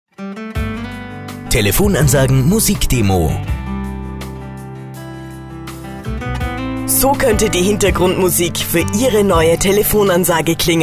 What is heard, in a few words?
Slow